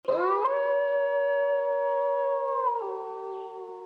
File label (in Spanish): aullido